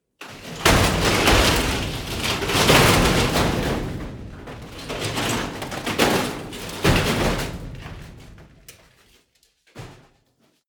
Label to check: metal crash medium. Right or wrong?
Right